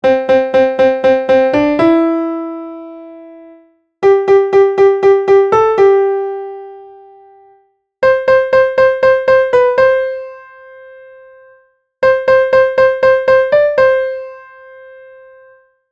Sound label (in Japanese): メロディ